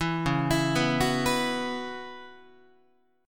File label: C sharp Minor 11th, Guitar; C#m11; arpeggiated; JGuitar